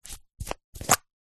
household insect repellent roll on plastic lid remove